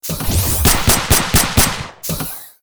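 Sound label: Missile Turret attack; monster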